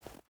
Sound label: footstep hide snow jump start; Rust